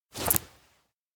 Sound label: throw konpeito; awayuki; anemoi